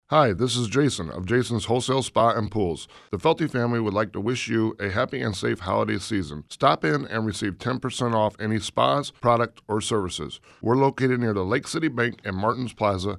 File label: Christmas Card